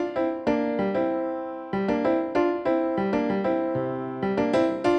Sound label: Latin Lounge Piano